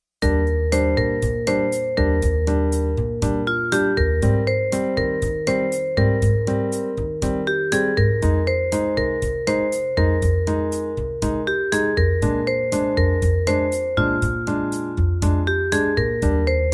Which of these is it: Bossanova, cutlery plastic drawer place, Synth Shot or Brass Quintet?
Bossanova